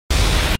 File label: Nightfly Power Dance